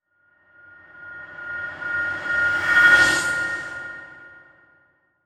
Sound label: Transition Whoosh Medium